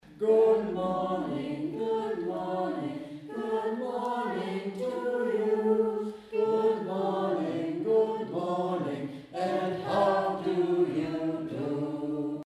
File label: Good morning